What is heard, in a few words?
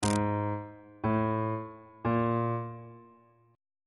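f flat diminished 4 perfect 4 augmented 4 intervals on bass clef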